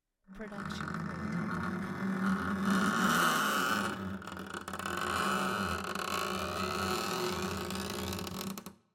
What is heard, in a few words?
horror scrape; soundscrate